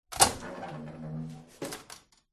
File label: household ironing board built in fold